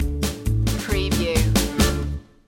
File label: polka stinger